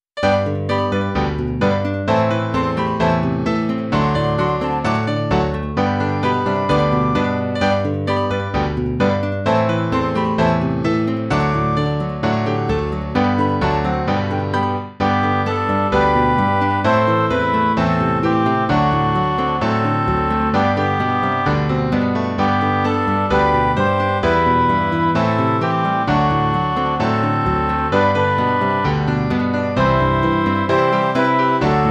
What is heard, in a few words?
come and sing midi